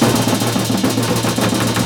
A#3, JAPANI PERC; Northstar; The Wizard VOL-1

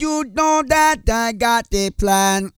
REGGAE, Vocal Planet World Voices

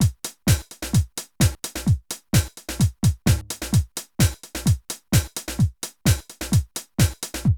drum loops, House & Garage

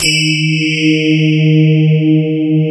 CMI PIANO; East West; Twisted Textures-BT

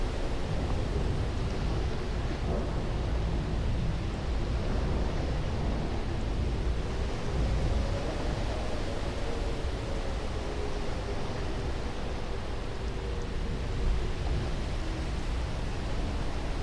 rain

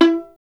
STR VLN, Solo Instruments; Miroslav Vitous

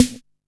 Snare, Drum & Bass; Claps; AMG - Prototype Drum & Bass; Snares

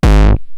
Roland TR-909 BD; BD Selection

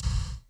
KIK TR, GATE KICK

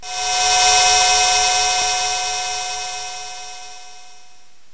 Roland R-8 wheel

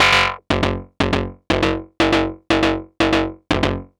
Techno Synth

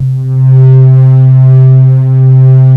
RESONANT; Pro-Rec - Synthsonic; Z1 PADS

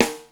sn fk; 300 Drum Machines; Yamaha Motif ES-Series

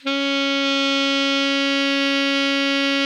ALTO MF C#3, Sax; Greytsounds; Philip Wolfe David Crozier's Saxophones

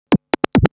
Scratch Fills 140BPM; Vengeance - Essential Clubsounds Vol.4

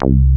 BASS, SYN BS; AKAI S6000 CD-ROM - Volume 1; SAMPLE WAVE MIXING 2; SYN BS #3

